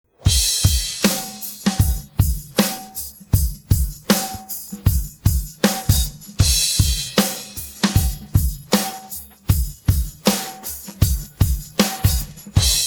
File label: pattern, drums